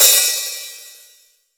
light open hi hat sample f key

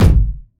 punchy kickdrum sound g sharp key

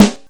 original snare g sharp key; snare drum

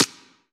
steel snare drum d key; snare drum samples

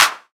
clap sample d key; percussion; single hits one shots

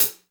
sparkle hat sound sample c key; tuned drums

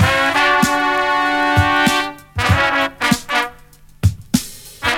96 bpm fresh breakbeat c sharp key; sample

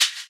shaker percussion a sharp key

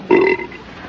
belches medium

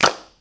hand clap sample E key; single hits one shots

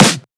bright steel snare drum sample g key

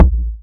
strong bottom end kick sound c key

